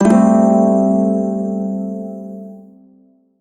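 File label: Shawzin Major Chord; Warframe; Prime Shawzin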